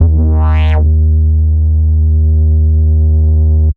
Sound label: Tech Bass D#1, techno 120bpm; Bass Multi; musicradar